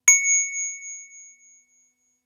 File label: sharp